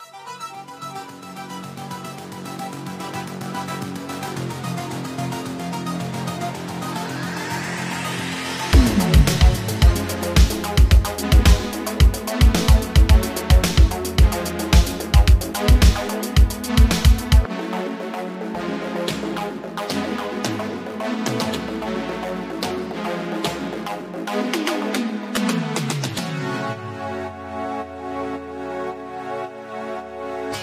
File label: Electro, synthwave